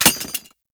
Uzi Mag Drop; Warframe; Tenno; Prime Tenno Uzi; Weapons

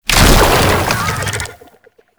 Roll End; Infested Oni

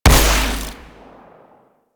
Amp Barrel Fire, Amplifiers

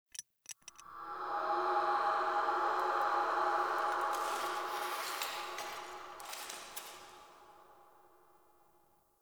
Helmet Idle; Friendly; Tuvalkane; Discovery

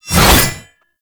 Bayonet Melee Lunge; Warframe; Tenno

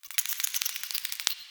Interior Clicky Critter, Infested, Ambience; Misc Indoor

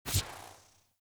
UI Theme Window Open; Zephyr

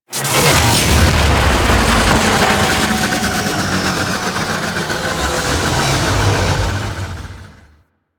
Fueling Station Emitter Shutdown; Warframe; Corpus; Railjack

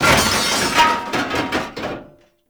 Desktop Metal Destroy; Ambience; Gameplay; Warframe; Entrati

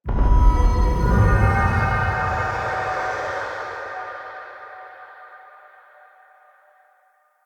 Secret Uncovered Hint; Puzzles

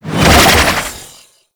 Archwing Prime Sword Whoosh; Warframe

